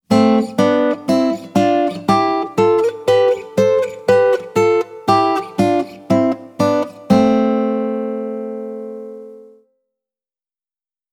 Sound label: Acoustic Strum, Tap, Slap; Guitar Techniques 2018 Issue 289 December